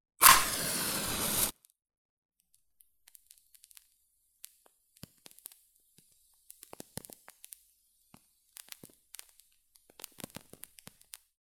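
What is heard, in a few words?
zajyganie spichki, striking a match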